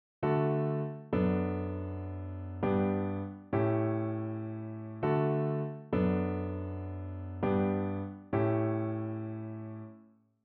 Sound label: chords